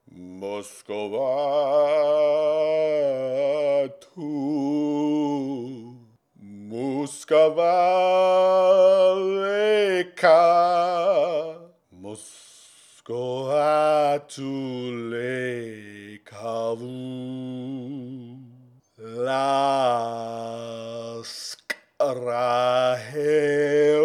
sing lament

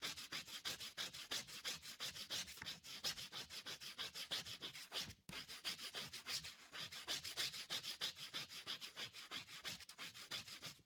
scroll work loop

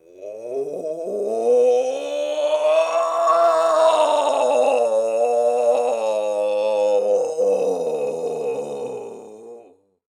death loop; Hollow Knight: Silksong

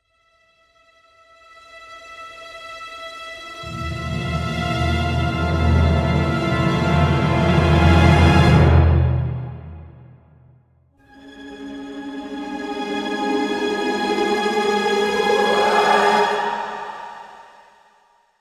Peak Tension Music; Hollow Knight: Silksong

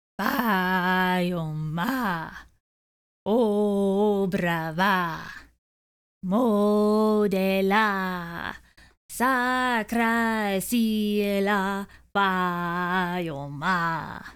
Female Sing

